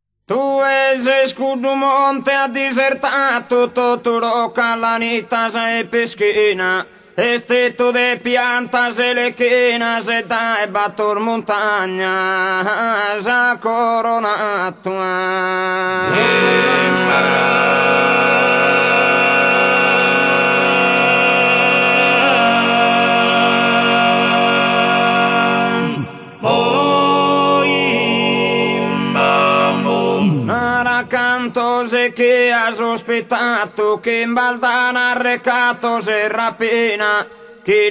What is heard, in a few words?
Tenores, a tenores singing